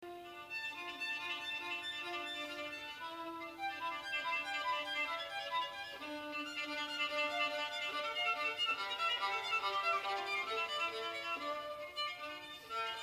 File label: violin